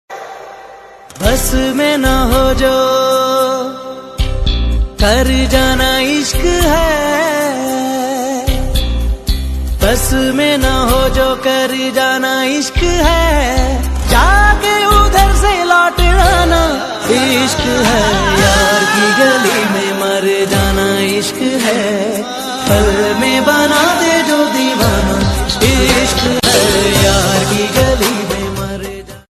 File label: pakistani ringtone